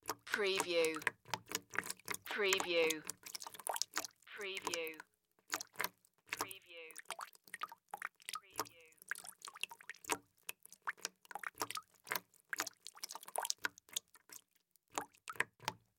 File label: Water Drip Bucket; Sound Effects Bible